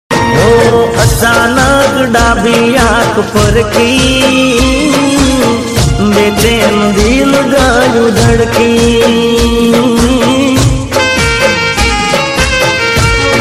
gujarati sad ringtone

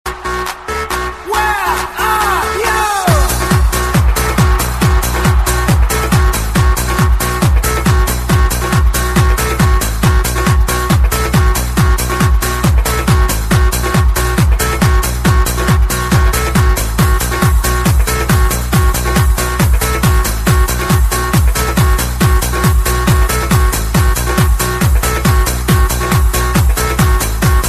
party time ringtone

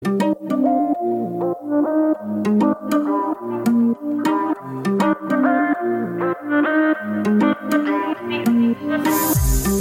iphone 8 tone ringtone; apple

